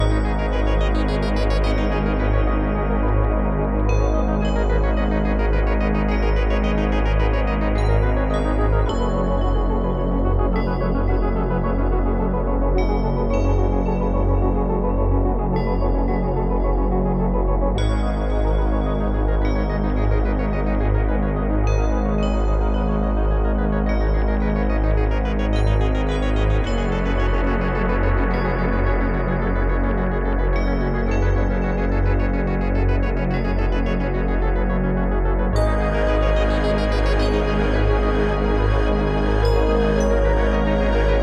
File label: Music 108bpm; Sockventure